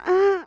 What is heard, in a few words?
die acolyte female